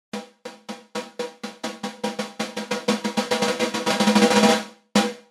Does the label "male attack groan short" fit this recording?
No